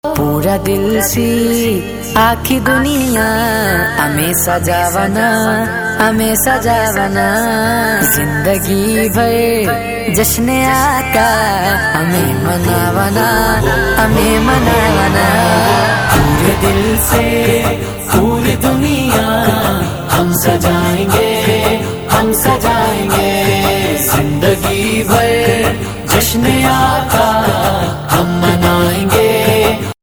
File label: islamic ringtone